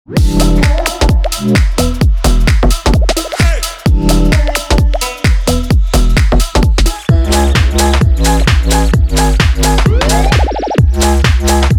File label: Footwork